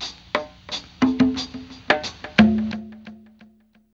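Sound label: perc loop, hip hop R&B; USB Soundscan vol.19; AKAI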